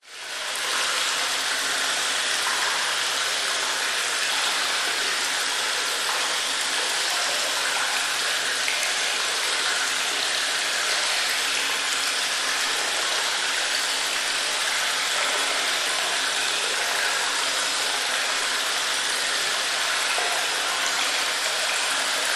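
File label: nature cave cavern ambience distant water running dripping; zapsplat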